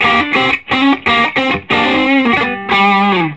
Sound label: SOLO G, Vintage Blues Guitar; USB Soundscan vol.22; AKAI